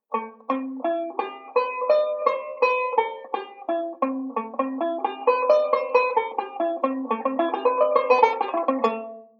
Modal Speed Drill, banjo; Beyond Chord Melody Volume II

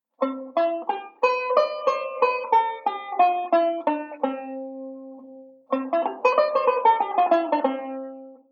Modal Sequence; Beyond Chord Melody Volume II

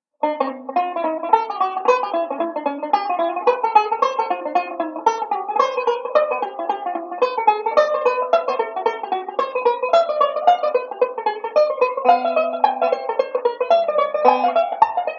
Enclosure Drill jazzy, banjo; Beyond Chord Melody Volume II

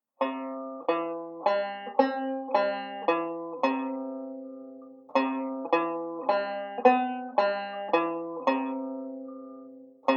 Jazz 7th Arpeggios, banjo; Beyond Chord Melody Volume II

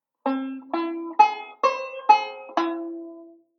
Augmented Arpeggio, banjo; Beyond Chord Melody Volume II

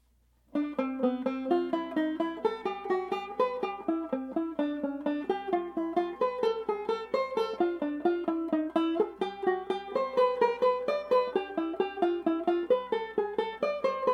Modal Pattern, plectrum banjo; jazz patterns for the plectrum banjo

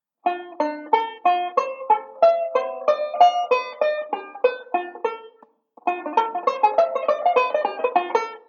ii V Pattern, banjo

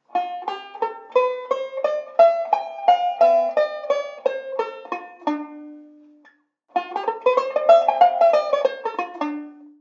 Modal Sequence, banjo; Beyond Chord Melody Volume II

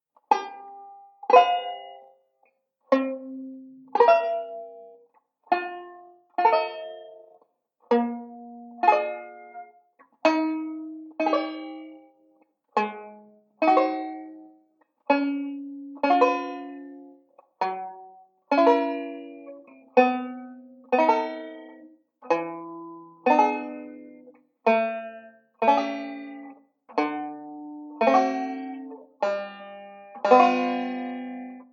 banjo; beyond chord melody volume ii

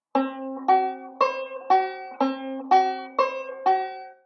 Tritone Arpeggio; Beyond Chord Melody Volume II

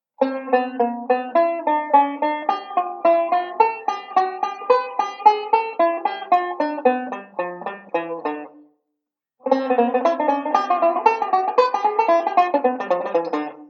ii V Pattern, banjo

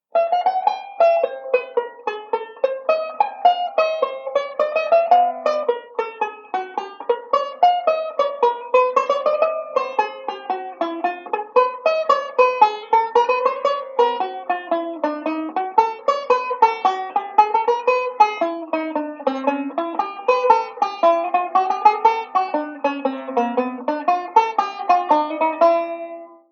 slow, chord melody; Beyond Chord Melody Volume II

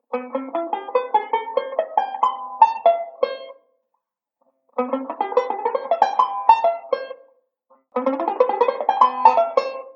Octave Arp, banjo; Beyond Chord Melody Volume II

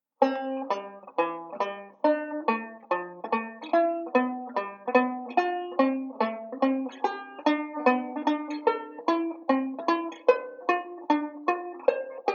Arpeggio Pattern; Beyond Chord Melody Volume II

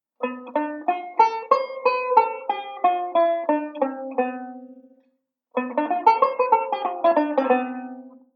Modal Sequence; Beyond Chord Melody Volume II

